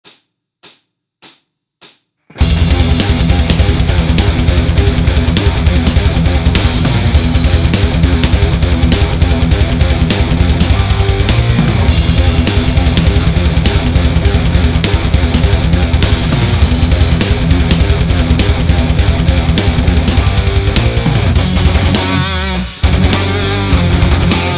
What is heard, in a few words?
Brutal Thrash